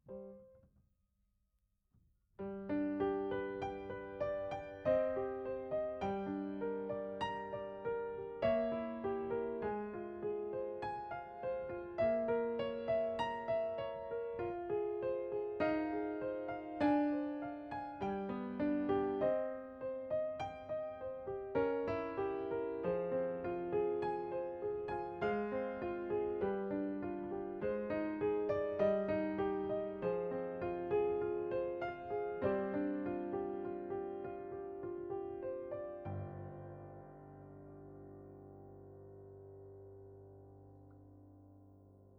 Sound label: Piano A